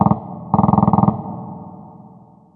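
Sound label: distant machinegun; Left 4 Dead 2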